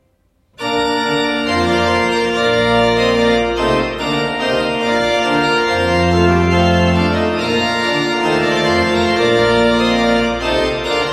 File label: Trumpet Tune